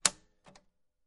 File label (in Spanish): cajas registradoras